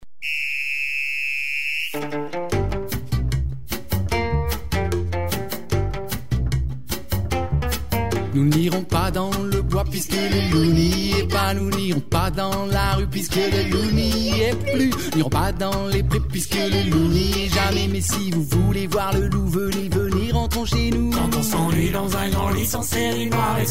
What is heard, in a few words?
cha cha